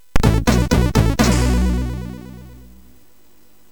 Two Jingles, MOS6581; MOS6581R4; hvsc

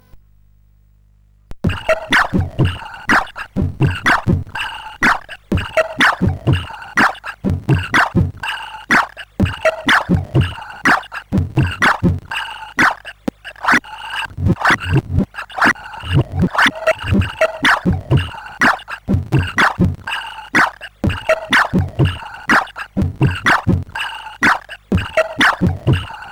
Samples, CSG8580R5; Hardware Accelerated Samples

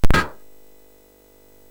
SFX, MOS6581; Narco Police; hvsc

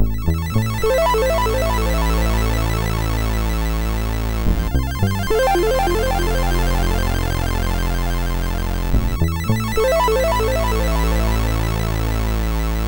sid, MOS6581; MOS6581R2; Space Orbs

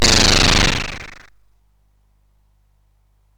SFX; Narco Police

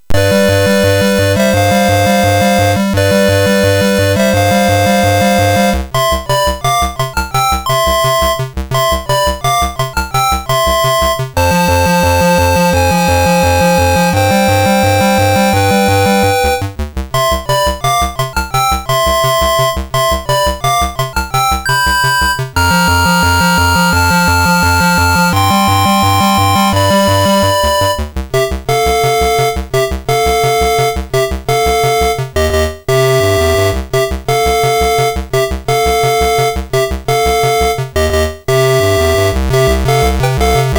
Slap Fight, MOS6581R3; hvsc

